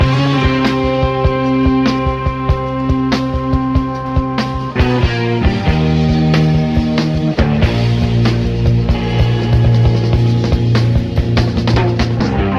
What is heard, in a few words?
guitar, Jazzmatazz Loops